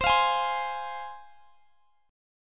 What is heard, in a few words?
C Suspended 4th Sharp 5th C Guitar Drop D; down; chord shape